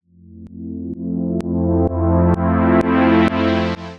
progressive house chord swell in F

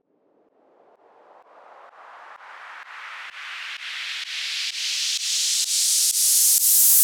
progressive house white noise riser

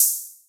classic house open hi hat one shot

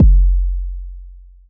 aggressive 808 kick

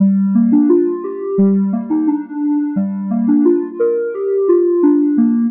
174bpm 4 bars liquid dnb synth melody

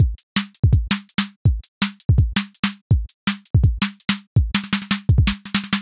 jungle amen break slice 165bpm

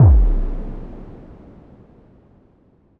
cinematic boom impact

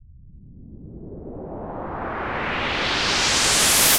progressive house white noise sweep; 4 seconds

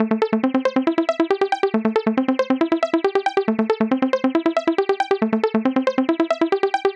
trance supersaw lead 138bpm

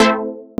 aggressive electro house lead stab

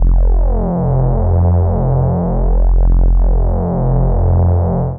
reese bass distorted; 5 seconds

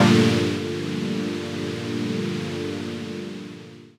deep house chord stab

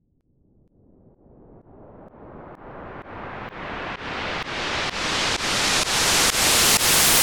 white noise progressive house riser sweep

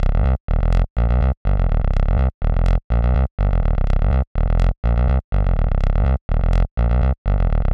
resonant 303 acid house bassline 124 bpm

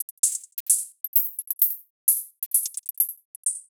glitchy techno hat loop 130bpm; 2 bars

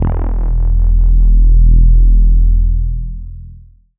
dark modulated bass stab sci fi vibe; 4 seconds

